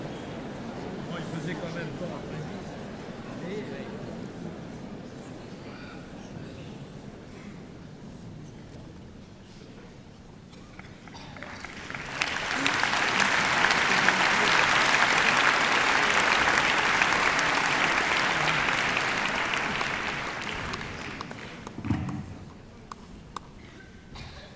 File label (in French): Rumeur Avant Concert